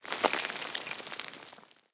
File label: bullet dirt debris; War Thunder